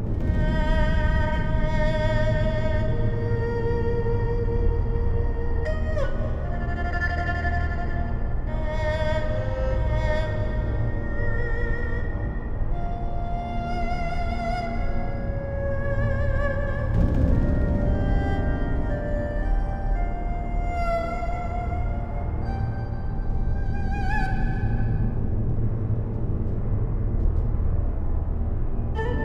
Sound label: tense; Music; GGJ 2017; IronToad